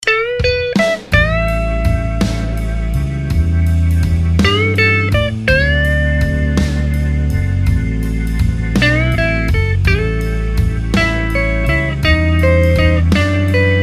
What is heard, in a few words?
Slow Blues in A